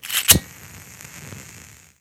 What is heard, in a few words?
cigar lighter; weapons